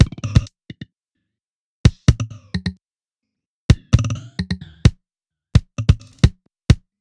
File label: Kick 130 Shuffle; Drums; Separated Drum Loops; Kicks; Ghosthack; Abstract Drums Volume 2; Drum Mega Bundle